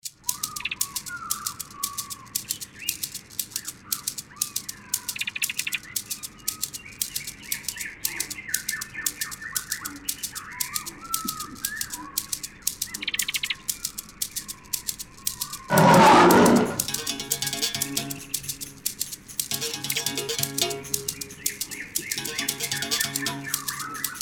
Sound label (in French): SANS VOIX